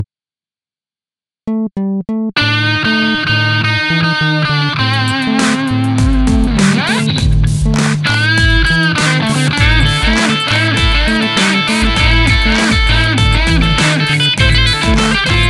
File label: Mini dub